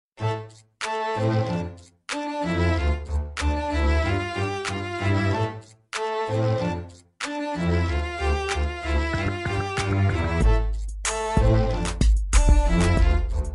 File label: Hip hop